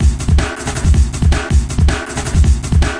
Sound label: 160 dnb beat; drum loops and breaks; 141 to 160 bpm